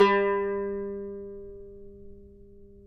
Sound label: CUMBUS G, Guitars; Spectrasonics; Hans Zimmer Guitars VOL-2